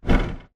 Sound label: bt footstep jog parts; Titanfall 2